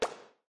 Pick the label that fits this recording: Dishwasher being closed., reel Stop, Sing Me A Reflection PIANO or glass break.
reel Stop